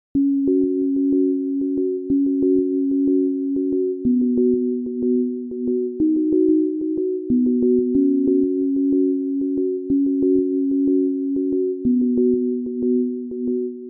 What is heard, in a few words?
Lullaby